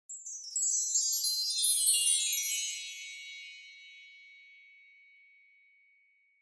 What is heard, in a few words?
Wind Chimes Down High